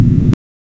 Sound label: idle fpv drone hover, loopable